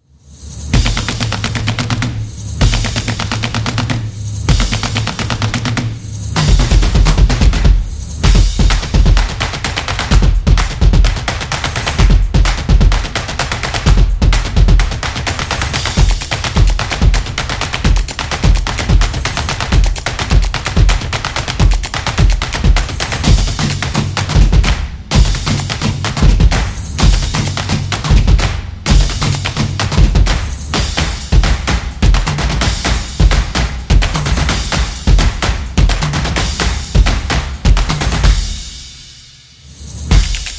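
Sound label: Fast Tempo, Street percussion background music built for sport, motion, and dance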